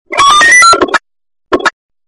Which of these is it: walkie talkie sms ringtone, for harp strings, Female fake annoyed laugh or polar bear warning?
walkie talkie sms ringtone